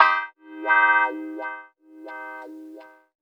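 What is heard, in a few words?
guitar, Roots Funk; USB Soundscan vol.41; AKAI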